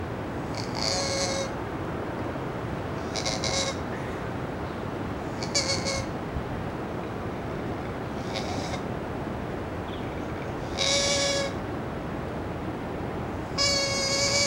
Cri koala

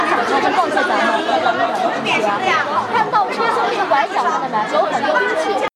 bruit à larmée enterrée

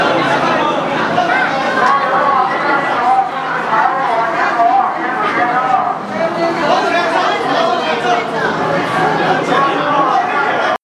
Bruit couveuses bébés pandas amplifié

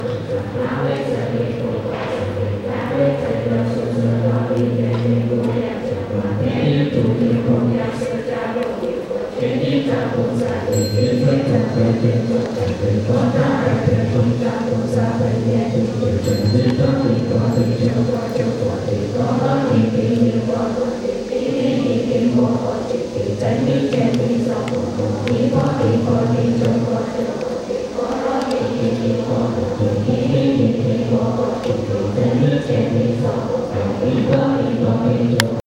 prières bouddhistes

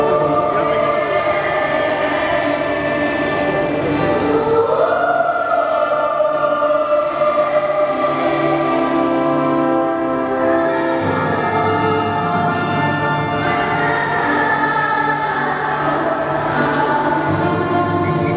enfants arequipa

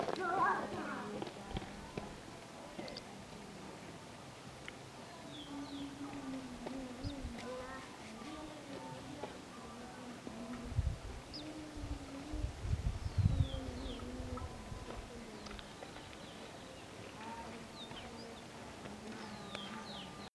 calme canyon de colca

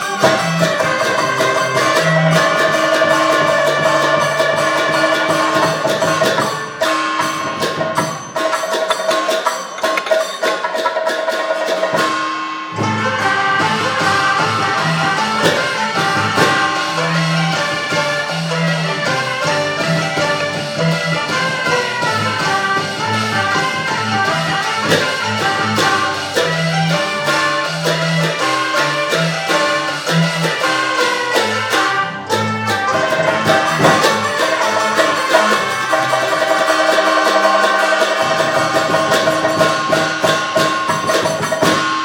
Opéra Danse

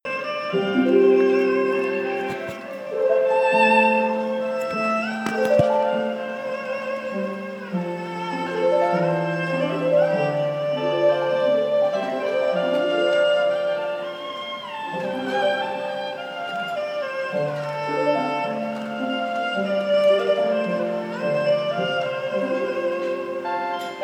Solo Erhu chinois; Opéra

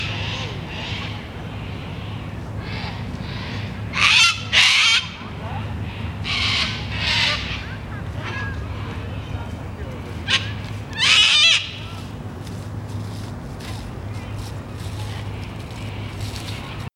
Bruit des cacatoes australiens

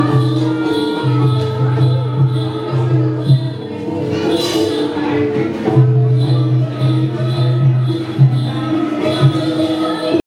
Ambiance musicale indonesienne

